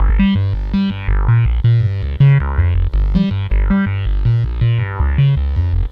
Bass, Synth Bass Loops; Basses; Sonic Foundry (Sony Creative Software); Groove Elements

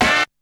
Hit; ZERO G - Datafile DISC 2

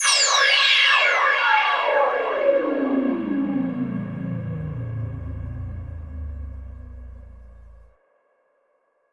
Fall, Hits & Falls; Zero-G Trance Inducer

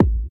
THROAT; Spectrasonics; Metamorphosis; THROATERS

